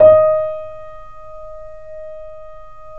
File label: D#, Piano; InVision Interactive; The Piano